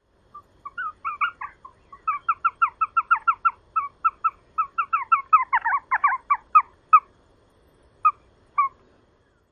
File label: Elf Owl calls during interaction